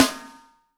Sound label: snare, Zoom MRT-3B